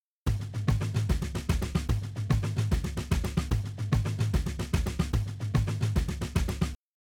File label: Drums, shuffle drums; WDR2